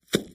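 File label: Chopping Off Fingers, gore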